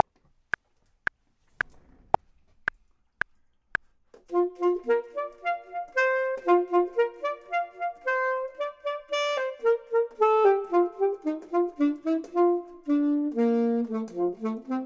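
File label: top, Saxophone and Clarinet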